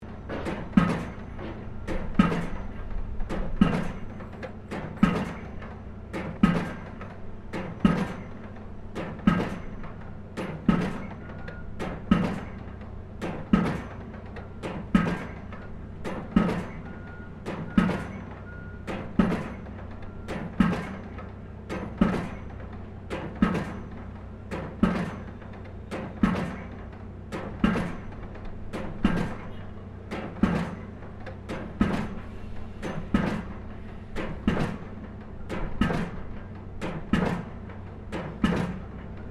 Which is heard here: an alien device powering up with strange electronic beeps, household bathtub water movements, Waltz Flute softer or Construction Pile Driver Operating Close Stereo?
Construction Pile Driver Operating Close Stereo